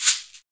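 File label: PER PROCU, Procussion, percussion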